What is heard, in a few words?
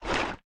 fluid inventory pickup; factorio 2.0.55